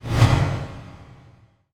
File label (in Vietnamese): tieng am thanh chuyen canh kim loai